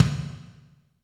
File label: Toms, Elektron MachineDrum SPS1 MKII